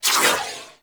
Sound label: Sapient Primary Tail; Weapons; Warframe